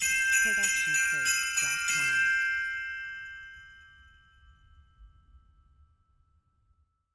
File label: Christmas Bells; SoundsCrate